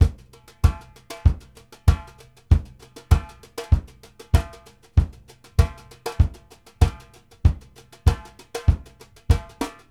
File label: 4 ON D FLO, BRUSH; Sampleheads - New York City Drumworks VOL-1